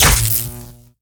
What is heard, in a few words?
Plasmagun Skin, Damage